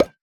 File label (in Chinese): 饰纹陶罐插入声